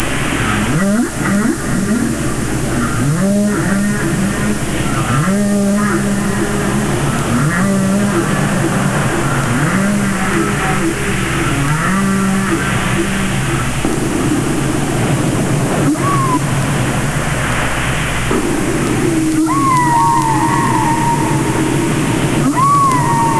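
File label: humpback, wale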